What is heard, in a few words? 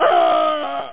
URGH; UFO Enemy Unknown CD32; Tactical Fx